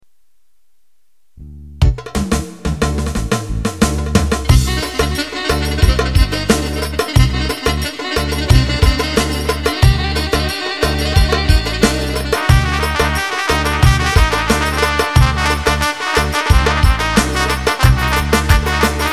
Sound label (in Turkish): ciftetelli, ketron sx3000 ritimleri